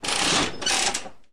spin, Slot Machines; RS Games Sounds

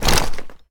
wall clothing; Golden Crayon Sounds

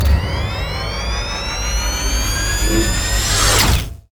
store porting; Original TK Sounds

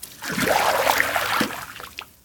deep water step; Golden Crayon Sounds

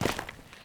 ash land; Golden Crayon Sounds

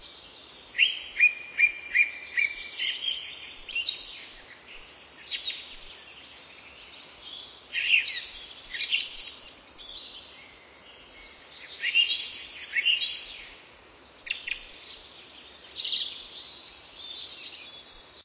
birds; Golden Crayon Sounds

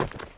dirt step; Golden Crayon Sounds

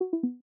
Liveness Fail; iOS 27

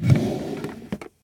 door open; Golden Crayon Sounds

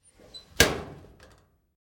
locker door close; Original TK Sounds

